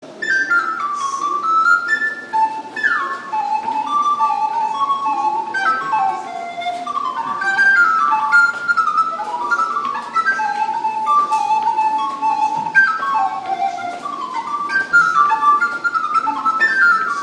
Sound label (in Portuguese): Flauta Doce; Conhecendo Instrumentos Musicais